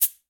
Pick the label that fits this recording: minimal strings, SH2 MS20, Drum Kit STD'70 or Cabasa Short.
Cabasa Short